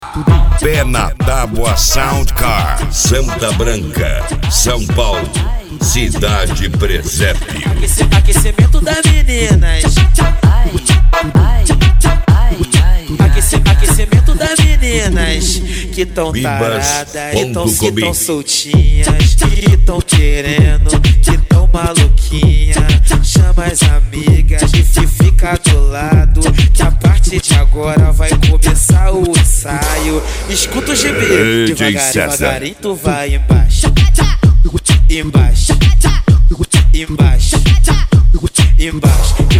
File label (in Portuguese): sound car carnaval